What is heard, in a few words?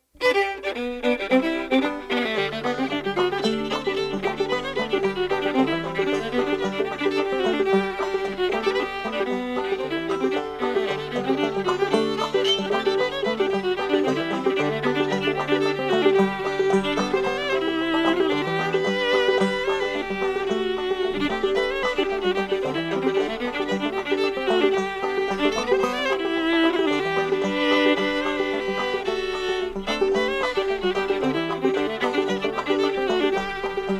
Pickin' On Ol' Banjo